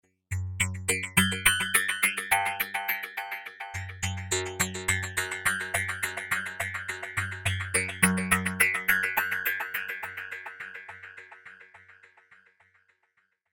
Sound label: Ghosts In Cyberspace; Ghost Sound Effects; science fiction sound effect